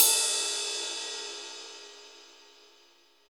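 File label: Cymbal Ride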